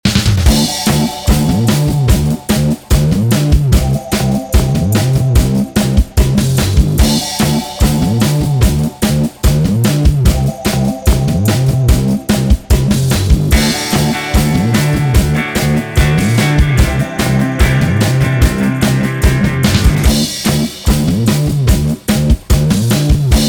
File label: Bass Face